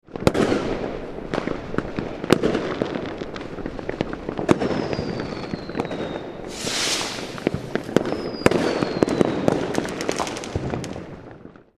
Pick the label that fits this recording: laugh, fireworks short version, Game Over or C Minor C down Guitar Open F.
fireworks short version